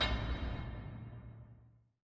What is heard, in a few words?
reel Bounce; netent